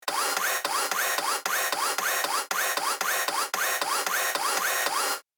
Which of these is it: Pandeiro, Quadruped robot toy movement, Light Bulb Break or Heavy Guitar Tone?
Quadruped robot toy movement